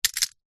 household padlock small metal drop concrete ground